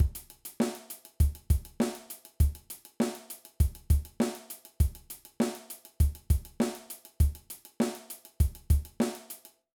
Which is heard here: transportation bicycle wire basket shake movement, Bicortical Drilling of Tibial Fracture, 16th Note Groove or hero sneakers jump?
16th Note Groove